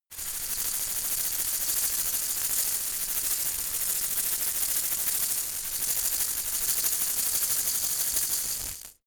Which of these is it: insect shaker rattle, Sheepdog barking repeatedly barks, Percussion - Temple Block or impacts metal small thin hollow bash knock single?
insect shaker rattle